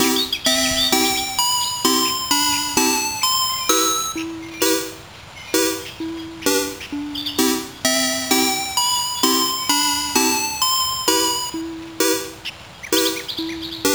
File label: bgm kids